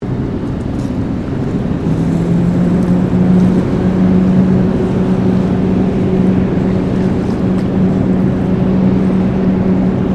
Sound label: Ambience Dredger Operating Ocean Gulls, Industrial dredger on barge; Construction